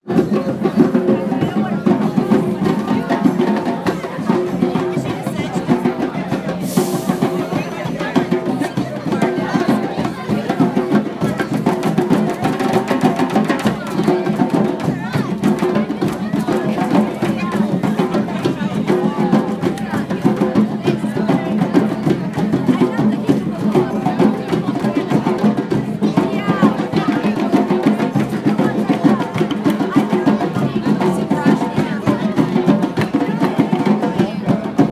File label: Massive Drum Circle